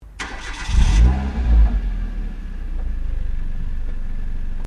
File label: Startup Exhaust, car; Hyundai Accent 2008; Hyundai hire car; Vehicles